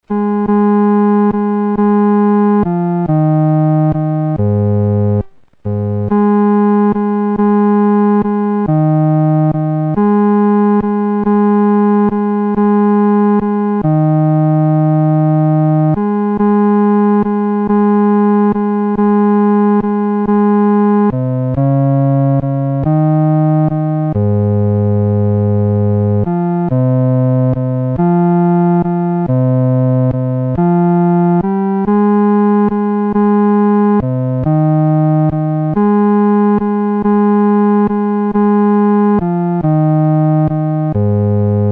独奏 第四声; 主寻迷羊

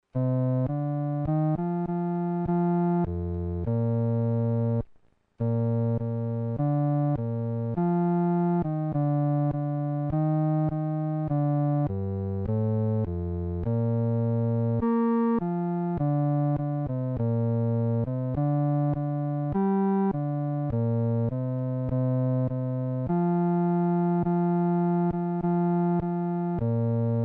独奏 第四声

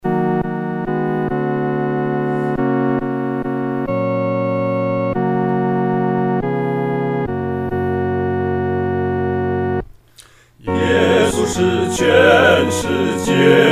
合唱 四声部

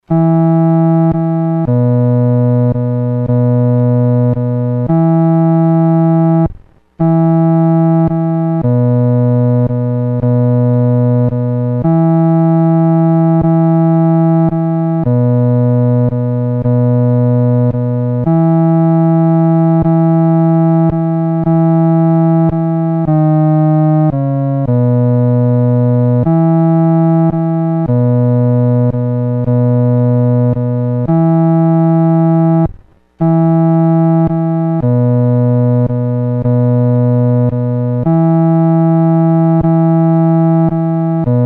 独奏 第四声; 奇妙圣经

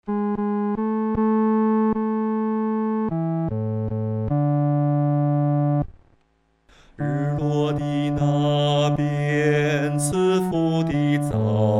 独唱 第四声

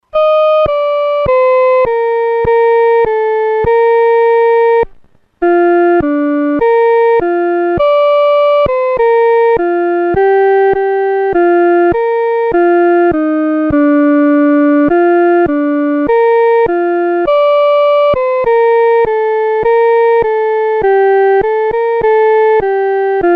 独奏 第一声

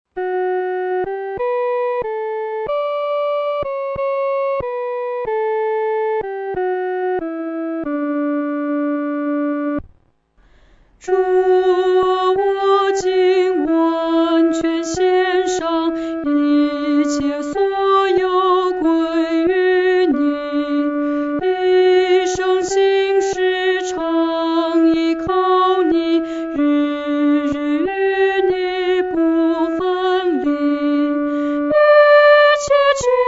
独唱 第一声

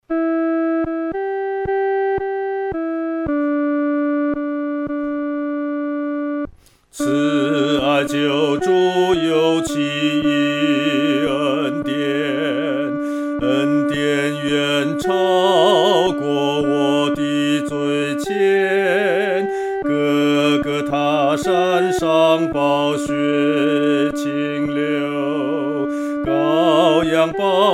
独唱 第二声

